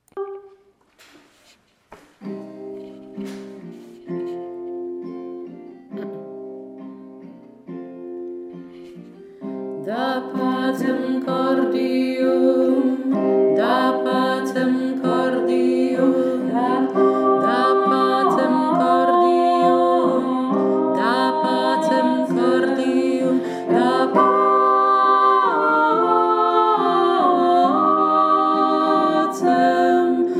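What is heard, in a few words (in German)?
zwei stimmen, jodler; da pacem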